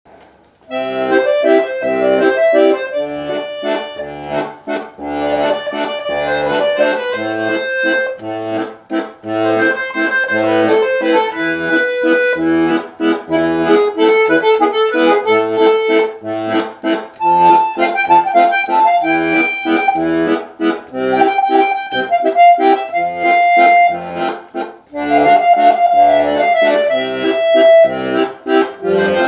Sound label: diatonic accordion